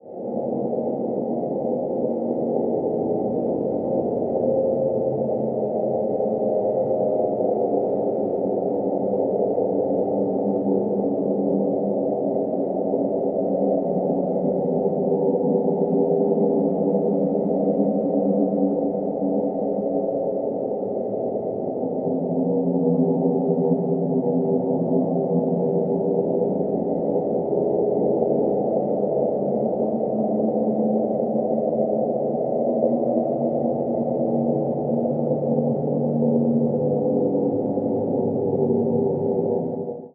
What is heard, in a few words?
Abnormal Atmosphere